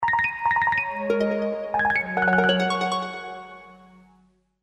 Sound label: Glow Alarm